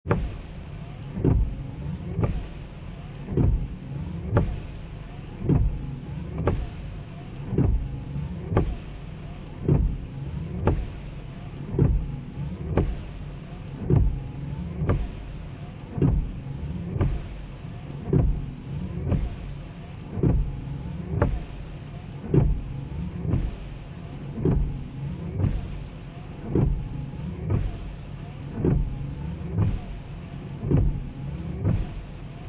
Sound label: Car Interior Windshield Wipers Medium, Car Interior, 1996 Acura Integra, Windshield Wipers, Running On Medium, No Engine; Transportation Automobiles